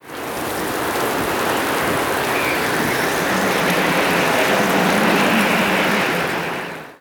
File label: Rain Moderate with Wind